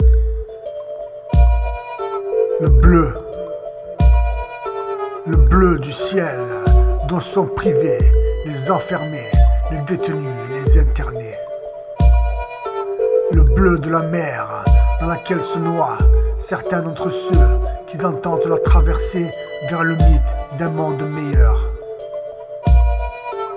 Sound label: Tongue Drum